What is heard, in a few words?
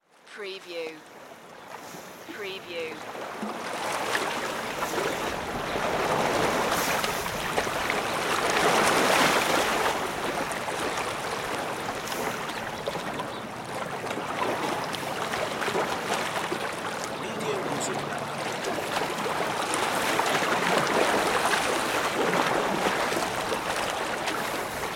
Lake Shore Windy Day; sound-effects-bible